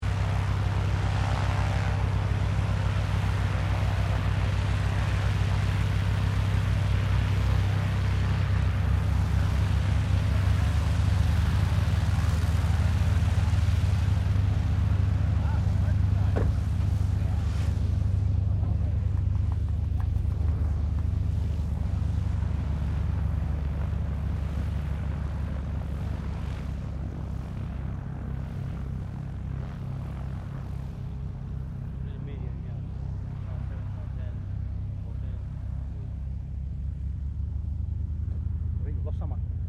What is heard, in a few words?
Airoplane Unknown Twin Prop Idle Prep Takeoff; Airplane; Avalon Airshow 2009; Airplanes; Vehicles